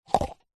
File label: household cup plastic stack into another; zapsplat